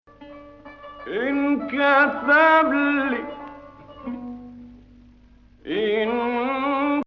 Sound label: Ajam; 2nd Ajam 8-11